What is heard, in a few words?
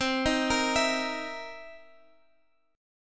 C Minor 7th Flat 11th, C, chord; arpeggiated; Cm7b11